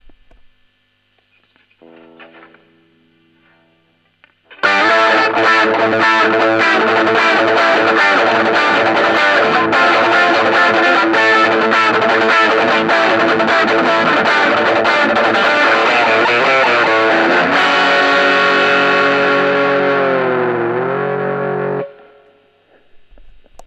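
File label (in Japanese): オーバードライブFATオン, 真空管ギターアンプ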